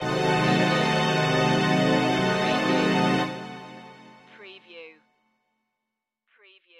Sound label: Stab Strings